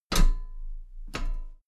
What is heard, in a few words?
step metal ladder step top regular